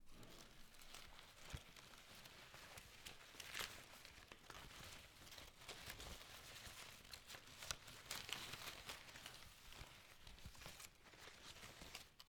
Rummaging Through Paper

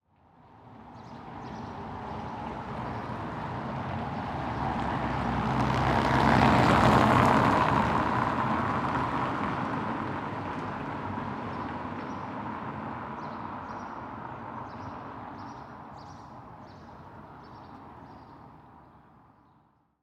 Car Drives Over Cobblestones

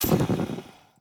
Flame Burst Ignite Bbq Barbecue

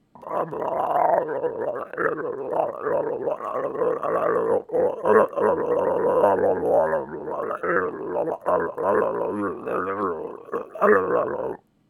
Growl

